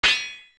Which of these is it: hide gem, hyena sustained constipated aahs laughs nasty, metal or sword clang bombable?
sword clang bombable